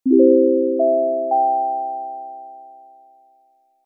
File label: Contact On, Tokyo Train Station; Adium